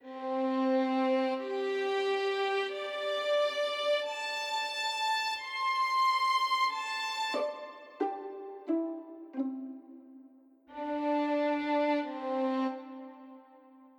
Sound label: Viora